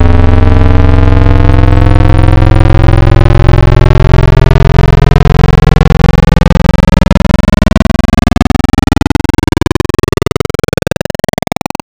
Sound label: Analog PWM Custom, wavetable